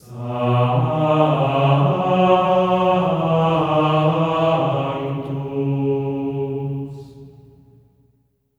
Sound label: SANCTU B3; Spectrasonics; Symphony of Voices; GR SANCTU